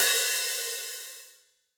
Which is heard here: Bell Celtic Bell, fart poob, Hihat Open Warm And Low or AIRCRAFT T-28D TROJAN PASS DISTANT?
Hihat Open Warm And Low